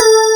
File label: DG WAVE, DIGI SYN